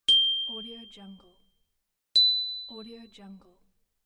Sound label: soft shine ding